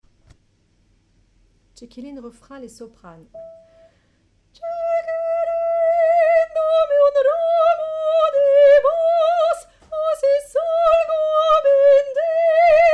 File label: Soprano